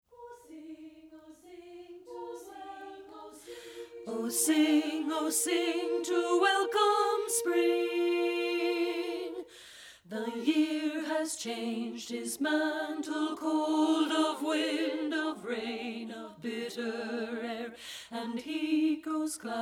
Spring Song alto; treble; partbypart; SSA

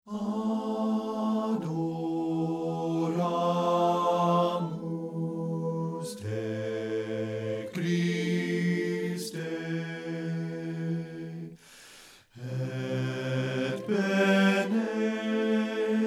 bass; satb

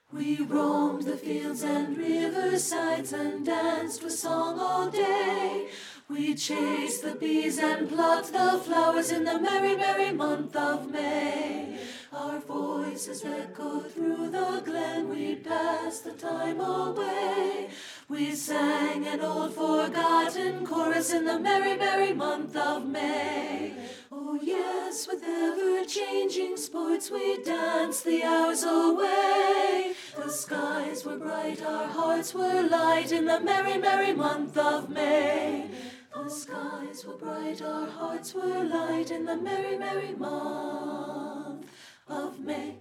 ALTO; satb

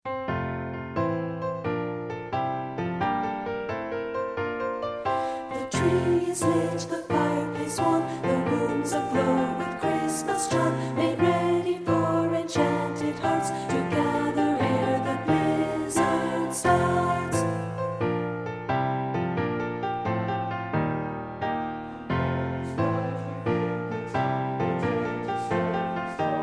ALTO

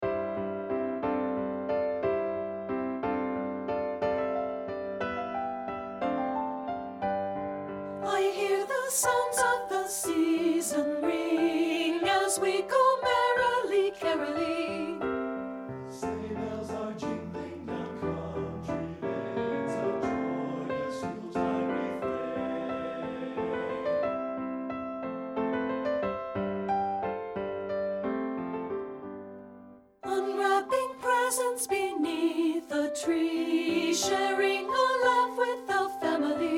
ALTO; satb

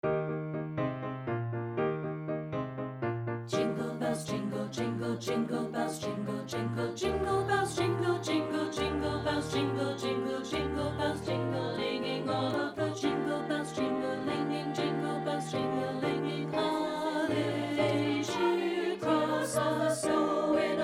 JINGLE BELLS ALTO; partbypart; satb

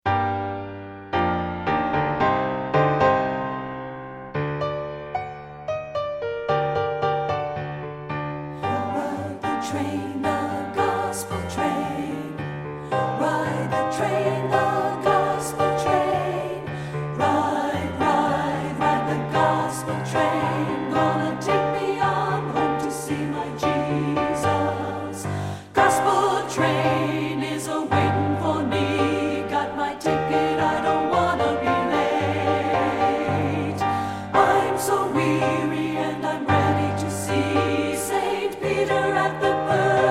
ALTO; satb

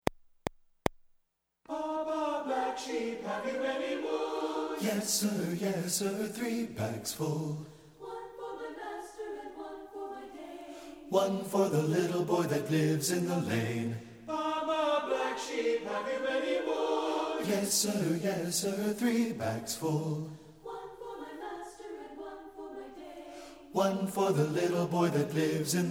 BASS; satb

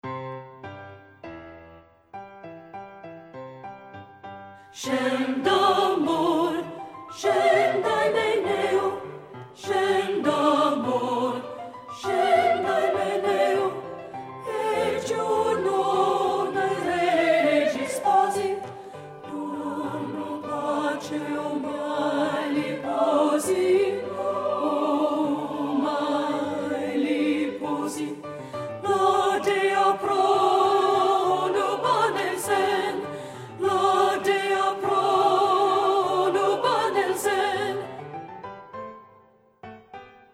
Scenda amor, alto; satb; partbypart

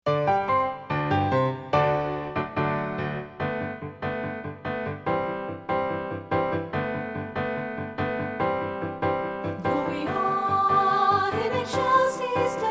Gloria 2PT; two part; partbypart

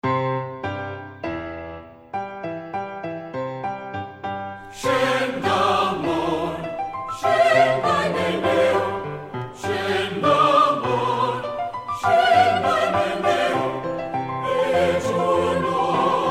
VOX; satb

